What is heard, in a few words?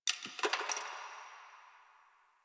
Ice Crack One Off